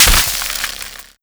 electric zap; player, damage

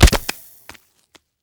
creep damage; Counter Strike Model Pack; CS:GO; survival

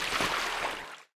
wade, footsteps

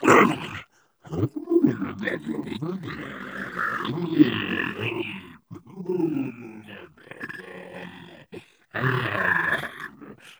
ballbot tbeam massage; player; Portal 2

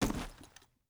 body impact soft

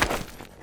sand, footsteps

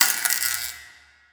light flicker; Portal 2

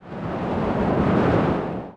wind

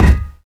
physics cube lg; Portal 2

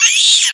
rat die; critters

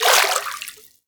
fs fm water, footsteps; Portal 2

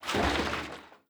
contents full, metal barrel; physics; props

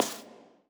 fs tile, footsteps; player; Portal 2

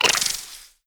scared, headcrab; creatures; vox; headcrab black

